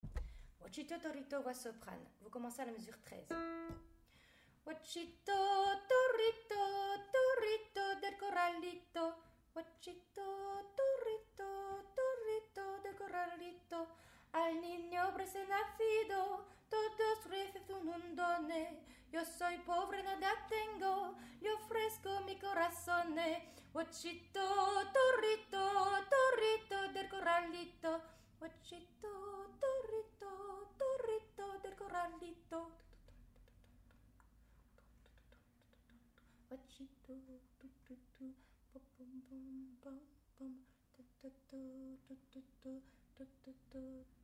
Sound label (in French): Soprane; Huachito Torito